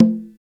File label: Percs